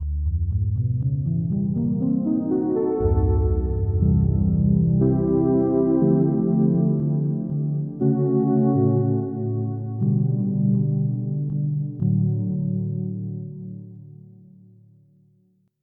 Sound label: Warm Pad; FF7